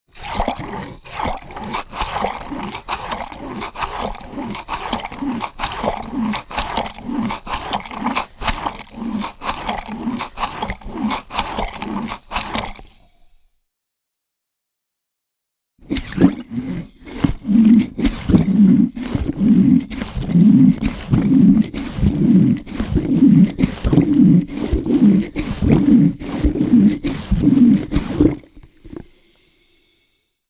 Vantuz chistyat zasor rakoviny

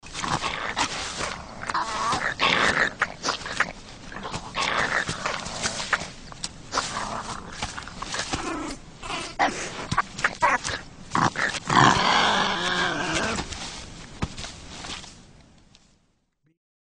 Rosomaha

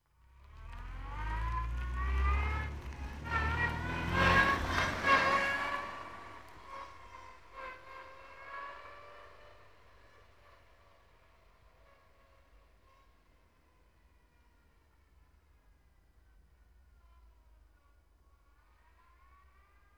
Zadom edet mashina

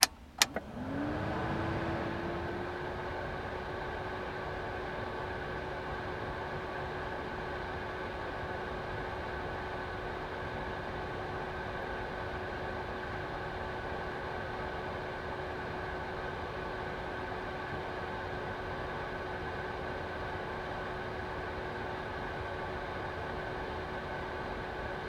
Ventilyacciya v mashine

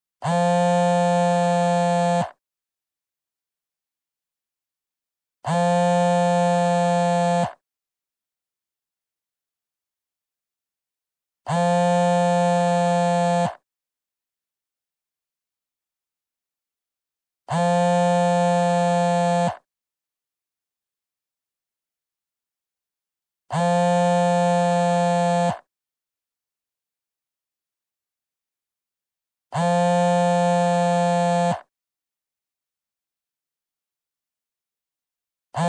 Vibracciya telefona

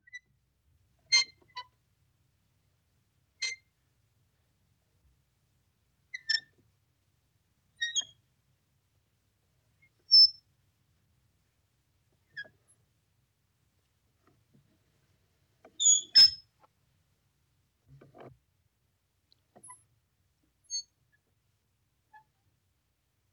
Ukljuchiny skripyat

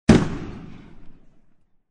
Petarda

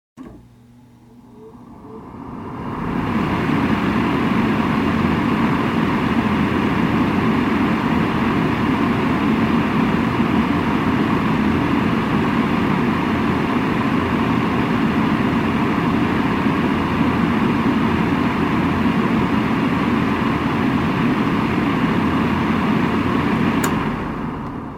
Sushilka dlya volos

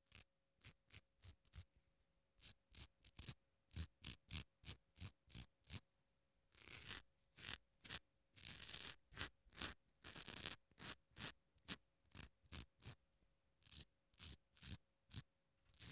Stankom brejut schetinu

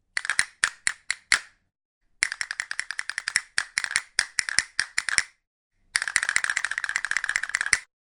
Kastanety

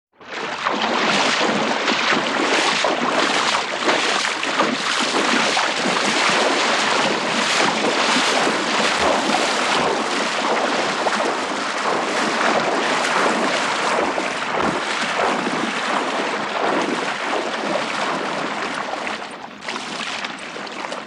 V vode hodyat po dnu

